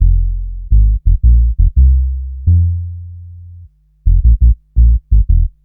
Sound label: Upright Bass, Drum & Bass; Fluid Dynamics